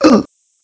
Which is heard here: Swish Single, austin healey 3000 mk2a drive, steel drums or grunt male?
grunt male